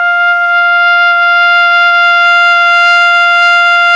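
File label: ALTO pianissimo F#4, Sax; Giga Samples Collection; ALTO SAX PP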